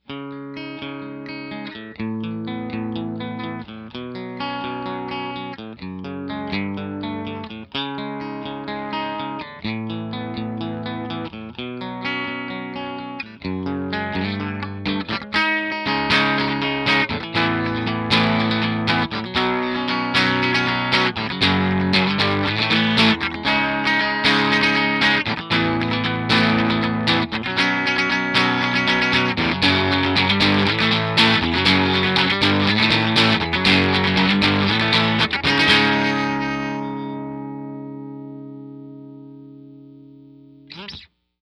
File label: TRIWATT PRS Single Coil Bridge Tone Tubbys; trinity